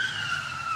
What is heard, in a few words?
car brake skidding; simpra